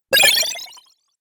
Game Console Arcade Power Up, Video Game Sound Effects